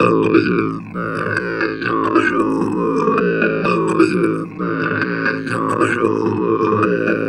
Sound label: didjer talk, talk box; Spectrasonic Distorted Reality 1